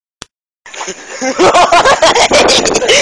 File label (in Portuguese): risada de pedreiro